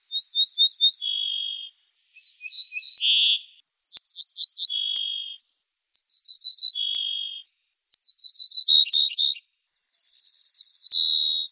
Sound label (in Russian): овсянка отличия; садовой; Изучаем песню овсянки обыкновенной